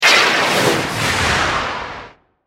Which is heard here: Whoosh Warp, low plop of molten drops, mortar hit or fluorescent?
Whoosh Warp